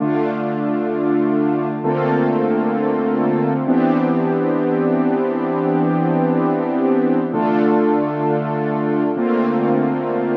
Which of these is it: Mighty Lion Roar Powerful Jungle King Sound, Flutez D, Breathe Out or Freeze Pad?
Freeze Pad